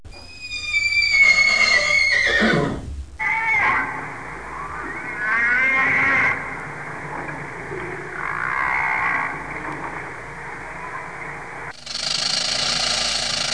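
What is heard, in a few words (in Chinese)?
ma jiaosheng, horse neighing; jiachujiaosheng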